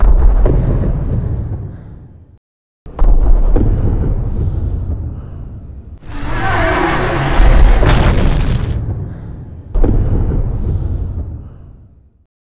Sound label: dinosaur steps